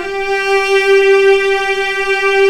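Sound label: G4, strings; East West; The Ultimate String Collection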